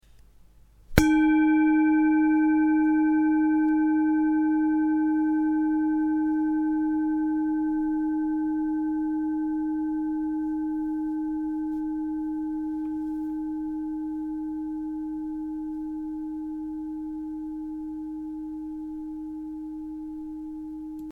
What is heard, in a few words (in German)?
Klangschale